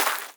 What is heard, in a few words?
block dirt; CubeGame